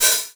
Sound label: Hat Synth